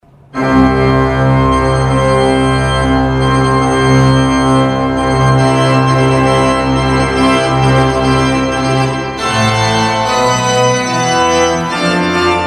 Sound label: Mendelssohn wedding March